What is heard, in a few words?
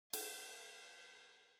ride